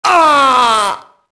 mobster death; enemy; Max Payne

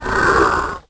moan

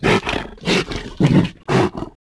breathing; Cars; Frank

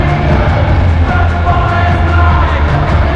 tannoy; Crowd; David Beckham Soccer; XBOX

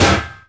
bouncy metal tube hit; bouncy objects; Tony Hawk's Project 8; XBOX

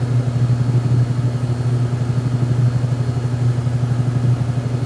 airtaxi steady, vehicles

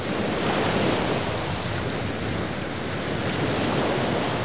water sea; Pirates Of The Caribbean

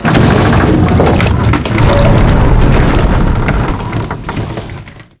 hit to board; shipcharge; Pirates Of The Caribbean

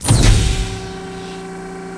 ramp open; XBOX; Star Wars Obi-Wan

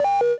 fed beep access; interface

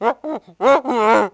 bigfoot; portland

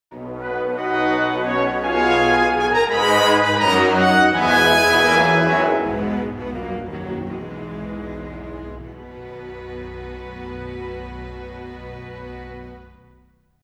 Victory, Music; XBOX; Jurassic Park Operation Genesis

